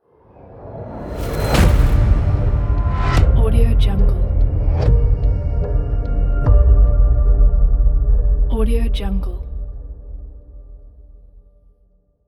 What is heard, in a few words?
dark cinematic intro logo